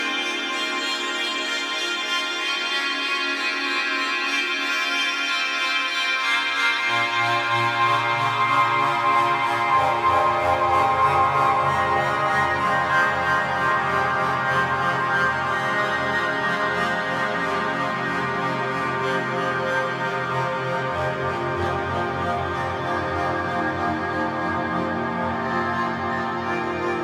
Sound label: ambient noodle